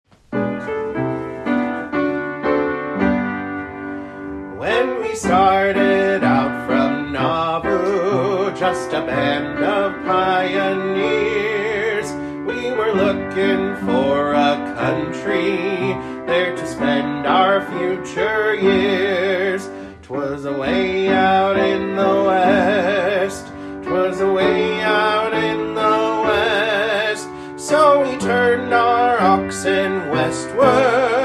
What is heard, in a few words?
Country in the West vocal